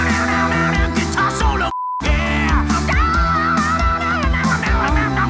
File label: guitar solo